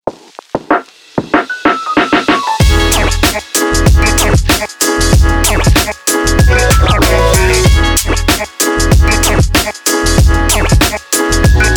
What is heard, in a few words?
upbeat background hip hop